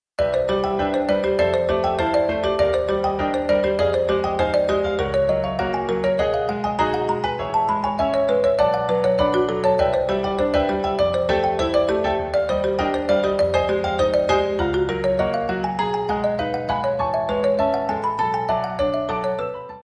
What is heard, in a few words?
ostinato